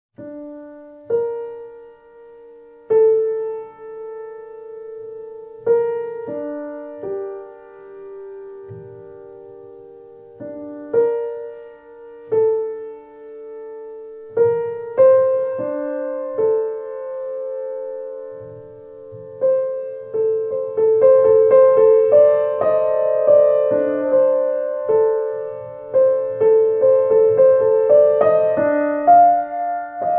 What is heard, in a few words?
Improvisation